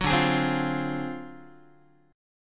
D 7th Sharp 9th Flat 5th D down Guitar; D7#9b5 strummed; JGuitar

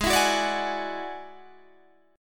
F sharp 7th Suspended 2nd Sharp 5th, G sharp

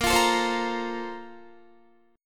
Bb Major 7th Suspended 2nd, Guitar

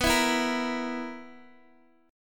B Major 7th Suspended 4th B down Guitar DADGAD

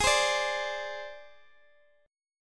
Ab Major Flat 5th Ab down Guitar DADGAD

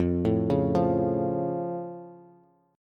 F Augmented F Bass; arpeggiated

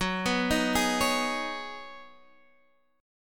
C sharp 7th Suspended 2nd Suspended 4th F sharp

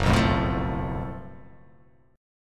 F sharp Minor Major 7th Double Flat 5th, guitar

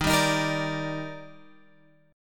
Eb Major 7th, down Guitar Open G

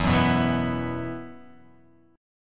E Suspended 2nd

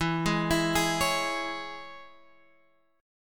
Ab Suspended 4th Sharp 5th chord

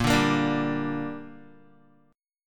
A sharp 6th, Guitar; A#6; strummed; 6,5,8,7,7,x

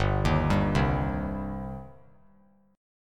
G Suspended 4th Sharp 5th G, down; Gsus4#5 arpeggiated; JGuitar

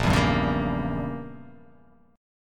A sharp Diminished 7th, chord

